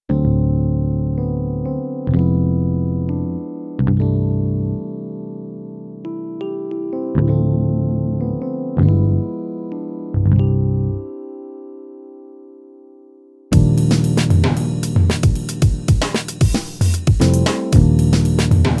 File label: Club Jazz, Korg Radias-R